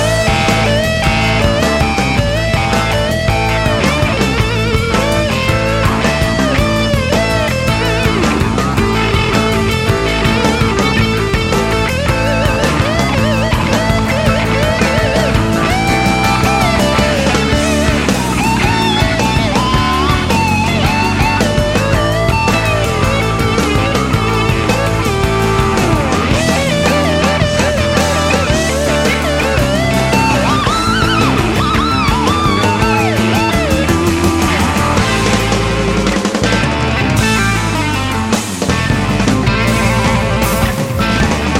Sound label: Guitar Intro